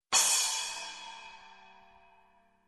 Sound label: Crash Cymbal